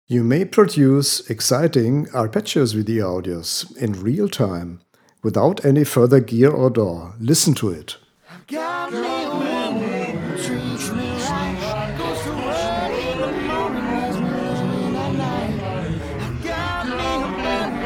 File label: Arpeggios